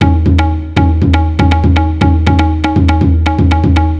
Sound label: loop